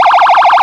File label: hyperyelp, sirens; code3 mastercomb